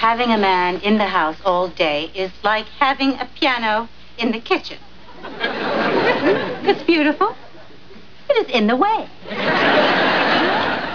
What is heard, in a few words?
piano